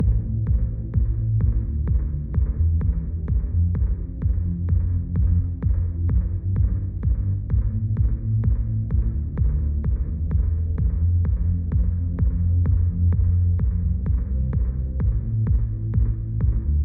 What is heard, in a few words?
Club Ambience Music